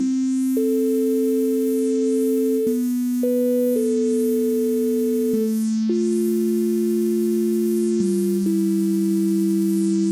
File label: chords